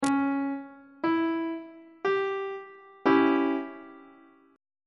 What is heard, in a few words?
d flat diminished chord on treble clef